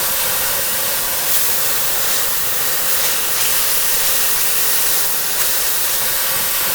radiator loop; Modern Warfare

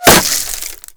impact wood; Modern Warfare

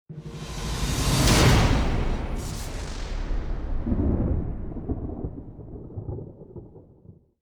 mission complete; dialogs; english; War Thunder

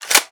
clip in; Call of Duty Modern Warfare

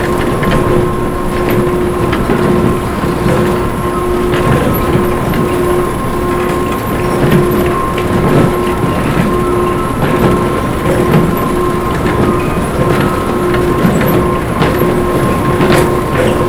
baggage belt loop

